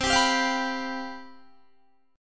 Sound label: C Major 7th Suspended 4th, down, Guitar; CM7sus4 strummed